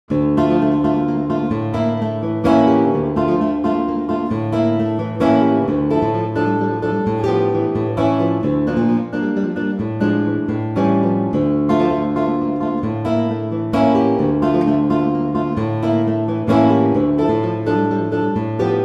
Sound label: rondena, guitar; pianoteq8